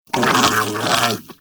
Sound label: Armadillo Vocal Snarl; Warframe; Characters; Hunting Critters